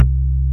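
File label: Pluck Bass; Best Service Gigapack 2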